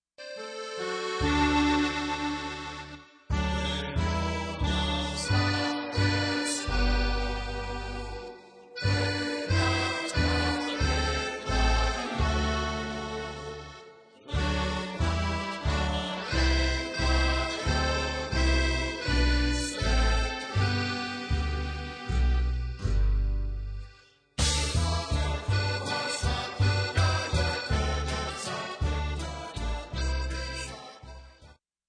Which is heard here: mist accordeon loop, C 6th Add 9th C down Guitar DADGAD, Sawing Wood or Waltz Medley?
Waltz Medley